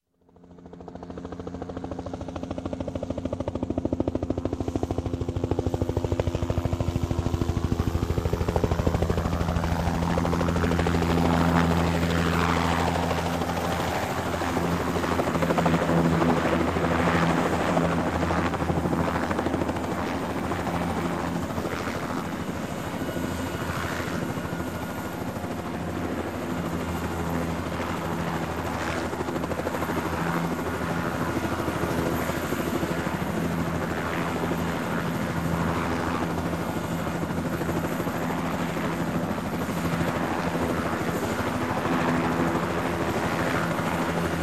Helicopter Bell Jet Ranger Approach - Land - Idle; Take Off; Sound Ideas 2000 Series

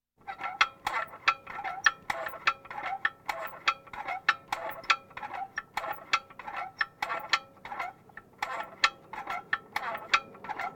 Military vehicles

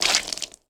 hit human organs; Blind Drive

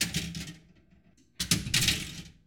Pinball Drain Lose Ball

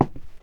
boots wood; Apotheorasis

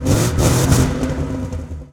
Truck Explorer Rev; Trucks

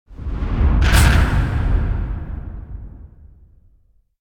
Door Slam Impact - Heavy Slow Motion Abstract; Hollywood Edge; sound designer's toolkit v2

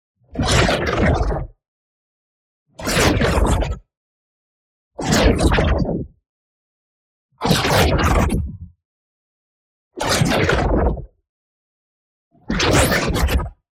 ABILITY CHARGE Aggressive Short; boom library; Matter

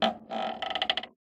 door creak, household; NS Studios - ultra sound collection

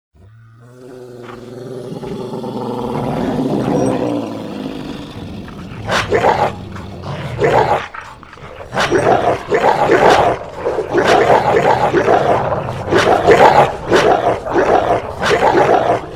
Turned

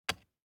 DICE on Plastic, Grab, Standard, 1 One Die; Samples; ArticulatedSounds; DICE Sfx Party (Extended)

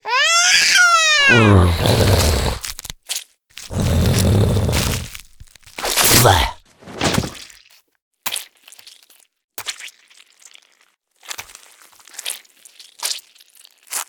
baby eaten; DeadFright

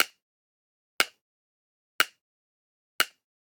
Generic Click Plastic Small

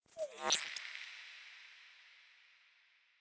Quirky Cymbal; Pitch Black - A Detective's Demise

